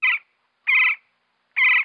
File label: woodpeck